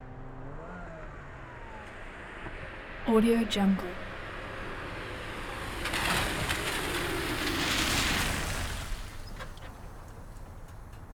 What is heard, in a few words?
auto through puddle in and stop turn off; prosoundfx